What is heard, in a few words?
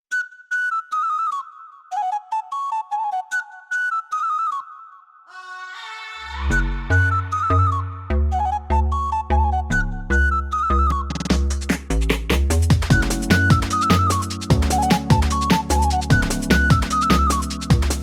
ukrainian folk jungle instrumental